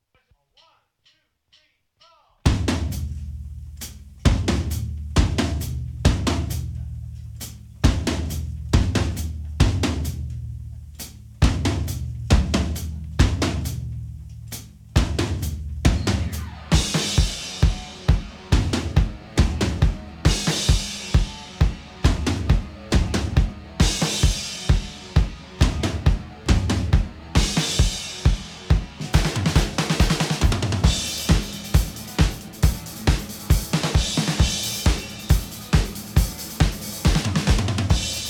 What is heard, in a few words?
drums